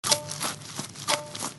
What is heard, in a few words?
monster eat